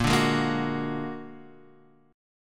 A sharp Augmented 7th A sharp Guitar Half Step Down